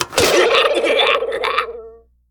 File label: Nostalgic Clown Attack